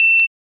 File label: bleep